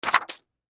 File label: Sand Paper Drop; Sander; Industry; Blastwave